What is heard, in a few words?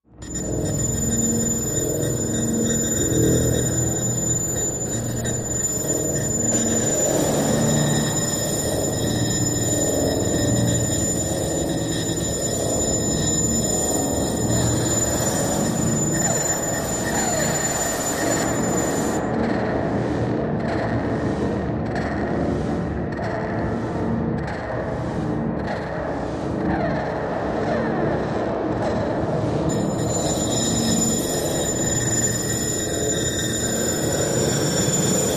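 Glitch Factory Machine, industrial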